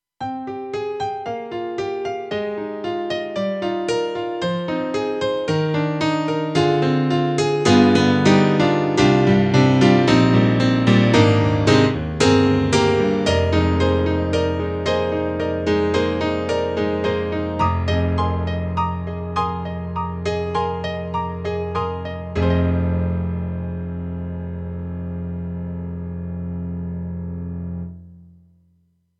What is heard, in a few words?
Acoustic Piano; KORG TR-Rack